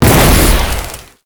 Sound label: Spike Orb Fire Impact; Warframe; Tau; Circles Of Hell